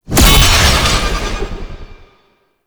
Knight Plunge Ability Cast; Warframe; Tau; Tau Dax; Abilities

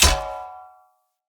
Great Sword Melee Impact Thrown All Surface; Weapon Impacts; Founders Great Sword; Environment Thrown

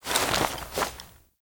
Horse Foley Dismount; Vehicles; Foley Actions; Warframe; Duviri

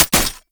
Mech, Weapons; Warframe; Braton

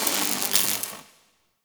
Elec Spark; Warframe; Nokko Colony; Ambience

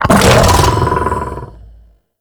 Howl Run Start; Warframe; Voruna

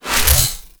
Flux Rifle Reload; Warframe; Crp Laser Rifle; BE Flux Rifle

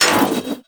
Spear Gun Mech; Hoplite

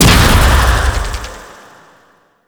Fist Ground Slam; Warframe; Mummy

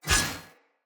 Sword Whoosh Accent; Weapons; Swords; Fenn Jotar Sword

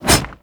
Melee Block Stop; Warframe; Wraith

